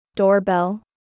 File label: doorbell